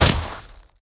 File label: land snow